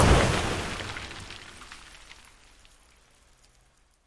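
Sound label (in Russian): разлетающиеся осколки от рухнувшей бетонной стены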